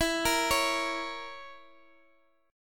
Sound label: Bb Diminished E